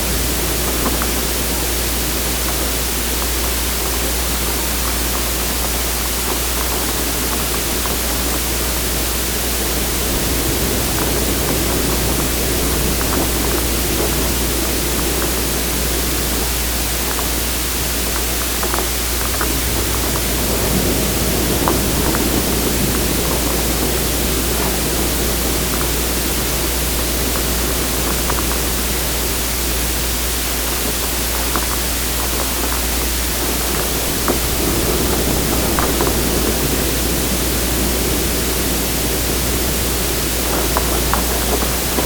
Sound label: rustling, Nest